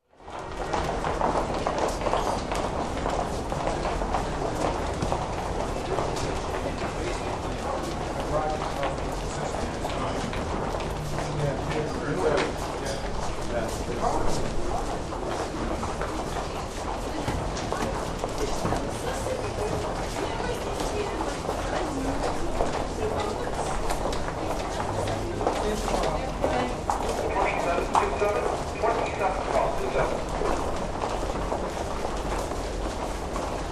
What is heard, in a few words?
Huge Crowd walking and passing by